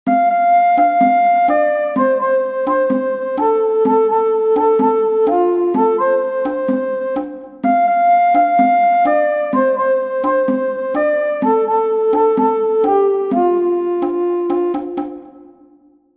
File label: Cajon; Every morning